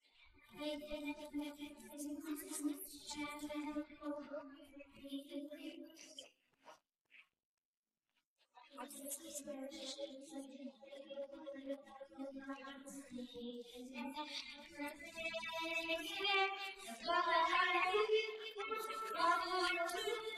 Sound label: vocals noise